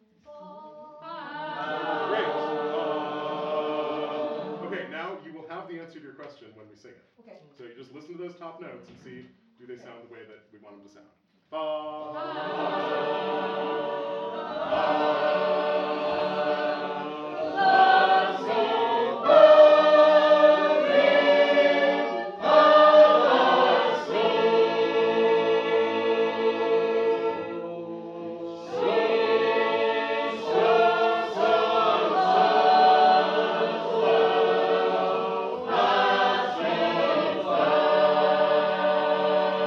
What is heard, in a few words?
Wells, sacred harp